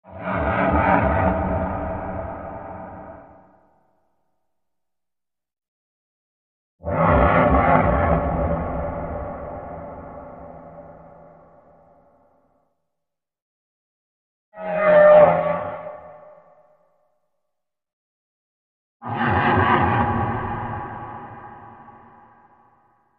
Monster Laugh Eerie

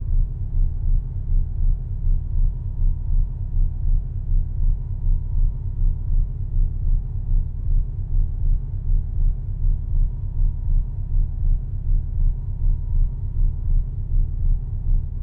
STARSHIP ENGINE ROOM, ambience

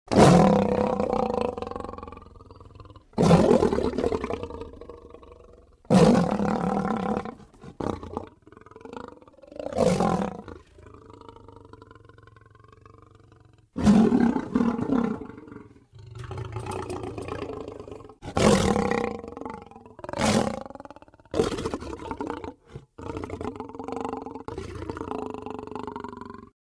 Lion Growl Medium; big cats

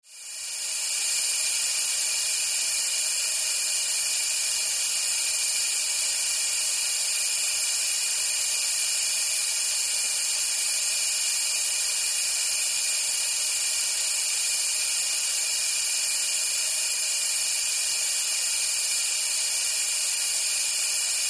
Steam, releases

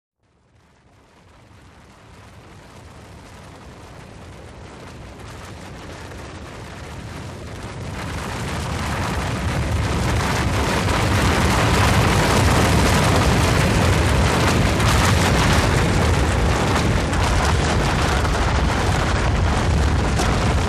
Avalanche Rumble With Rocks Rolling And Debris

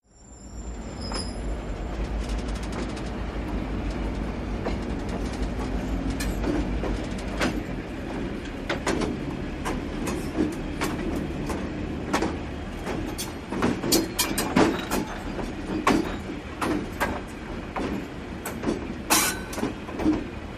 Train Wheels Clank, diesel

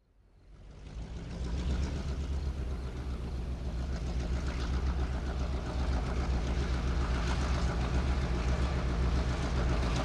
Train Station Stop

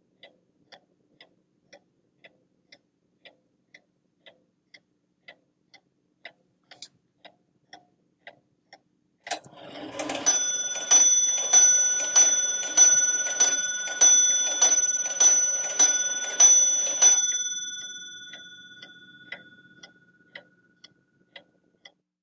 Grandfather Clock Ticks

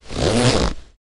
Denim Jean Zipper; zippers

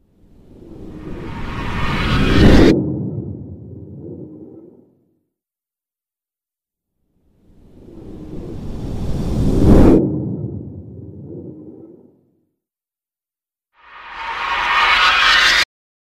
Flashback Entry Com, whooshes & swishes

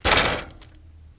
Bicycle Drop Clank

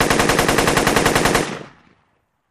Machine Gun Sngl Rap, single burst; Machine Gun Single Rapid Fire Burst

